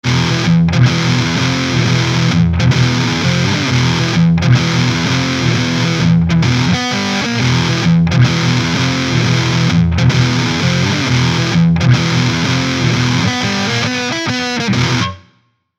Neck Bridge Piezo; Fame Forum IV IF

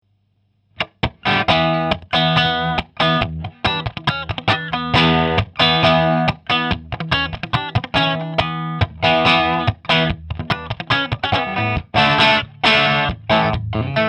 Channel 2 Bridge Bridge PU

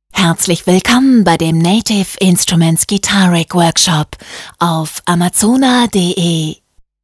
Vocal

